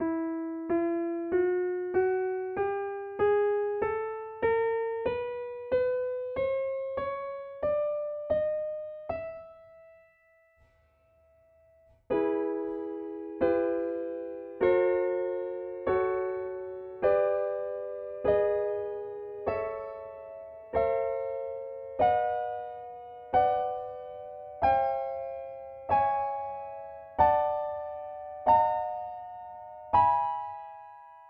21edo; Whitewood14

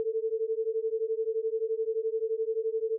Autogenerated sine 120 cents

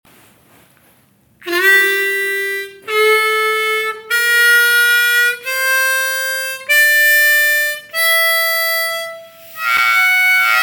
Harmonica; Mahur

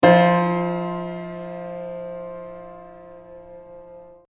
Sus7 chord midi

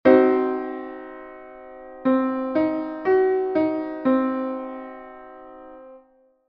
Cdim (19-EDO)

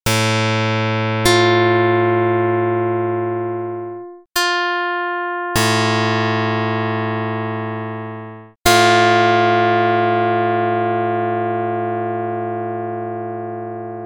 pluck; dr110

